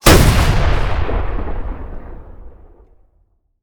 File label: fire, rocket; gmod; arccw uc commons attachments